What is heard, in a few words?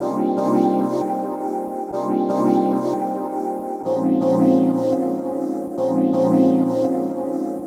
Pad Loop 125, Loungin House; Music-Fx Loops 125; Equipped Music